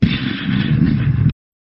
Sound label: jingle